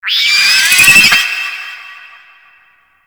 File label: Drone Charge Warning; Warframe; Infested